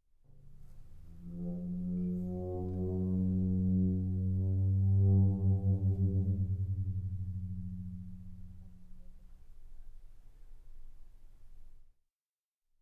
Instrument Floor Tom Drum Friction Mallet Med Vibrate OmniMic; Guild Wars 2 (Original Foley Soundtrack)